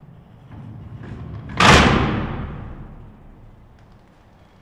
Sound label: Jail Door Roll Close; jail & prison doors